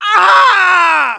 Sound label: Soldier pain severe, de